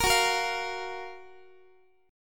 F sharp Major 7th, Guitar; F#M7 Chord; strummed; x,x,13,16,14,16

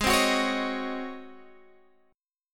Ab 11th Ab Guitar Open F; strummed; JGuitar

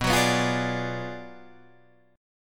B Minor 6th Sharp 11th B

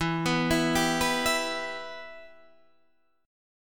E-Minor, Guitar, Half Step Down

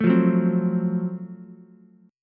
Gb Minor Major 7th Flat 5th, Ukulele; strummed; 2,5,2,3

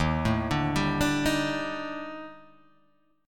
D sharp Major 7th Flat 5th, down, Guitar, Open G

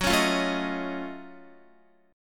Gb Minor Major 13th Gb down Guitar; GbmM13 strummed; JGuitar

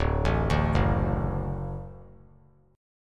D Major D down, D Chord; D arpeggiated; JGuitar

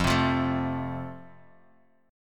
F Major, Guitar Open F; strummed

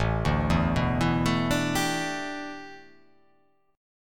A 9th Suspended 4th, A9sus4 chord; arpeggiated; JGuitar

